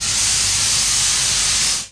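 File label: Cockpit Extinguisher; PC Gamer IT CD 47; USAF; USAFDEMO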